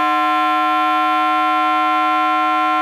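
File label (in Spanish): Complejos, saxofón multifónico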